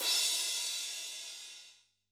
Crash, drum

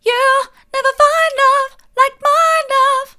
mid, vocal

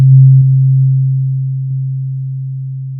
pop, Sound of a church bell

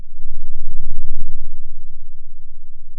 Sound of a lion’s roar

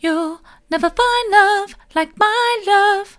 soft, vocal

